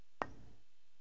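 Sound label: stroke, 9ball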